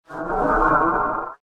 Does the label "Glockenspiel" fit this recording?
No